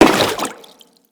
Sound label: Crewman Step Water, Footsteps; Corpus